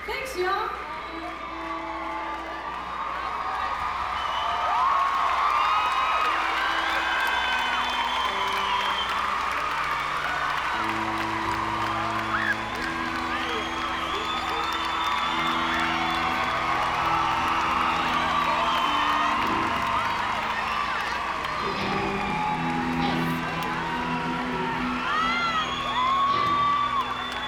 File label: applause